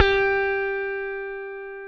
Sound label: JAZZ GT G3, GUITAR; AKAI S-Series CD-ROM Sound Library VOL-8